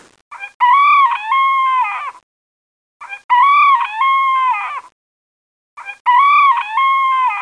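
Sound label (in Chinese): gongji jiaosheng, rooster crowing; jiachu jiaosheng; jiaqing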